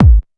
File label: dance 1, bassdrum; Ueberschall; Techno Trance Essentials; DRUMS; BD DANCE1